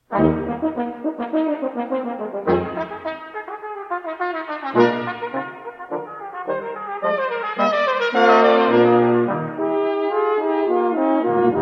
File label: Brass Quintet